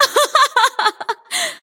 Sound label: laugh